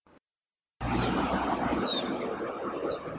Everetts White eye nesting material calls; Amar

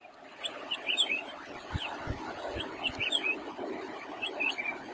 Black crested Bulbul calls noise removed; Amar

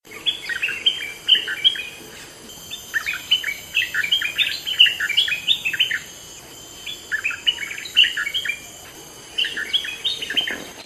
Striped Throated Bulbul calls; Amar

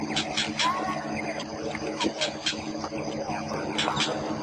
White rumped Shama immature alarm calls; Amar

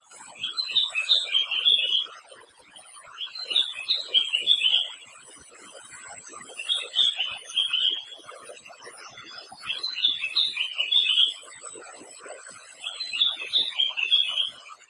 Spectacled Bulbul adult male calls

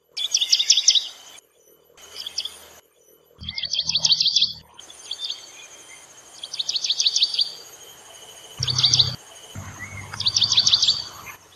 Rufescent Prinia nesting warning; Ulu Kinta Forest Reserve Perak Malaysia

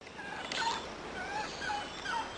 Barbet Fire tuffted Juvenile call; Amar

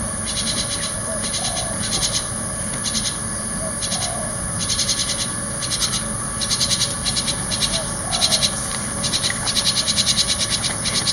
Javan Myna juvenile calls; Ipoh Perak Malaysia

